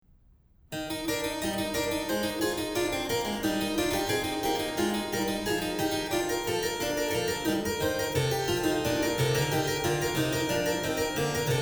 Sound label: Harpsichord; Bach; Prelude in E; BWV 937